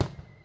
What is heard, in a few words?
PERC COMBI; Crypton; DrumBase5000